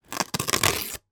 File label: office printer label label tear from printer gently; dymo 4xl